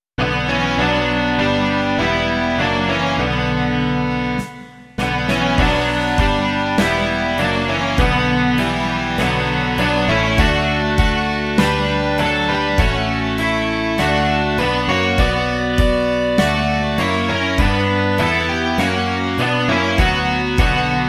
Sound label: rock